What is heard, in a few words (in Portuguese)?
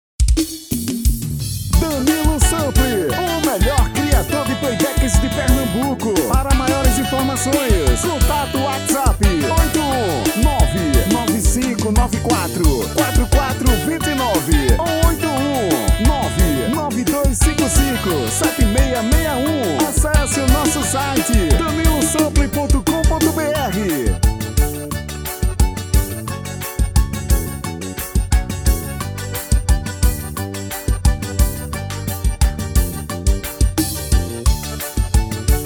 Xote